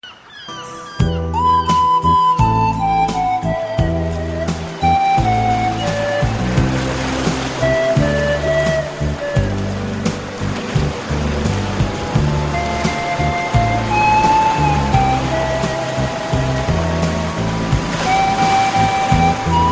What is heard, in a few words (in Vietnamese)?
tieng song bien